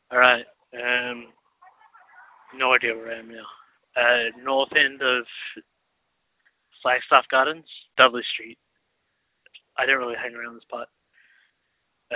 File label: silhouettes & staccato birdsong